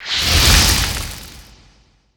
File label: impact bonus damage pain; weapons; dragons fury; tf2classic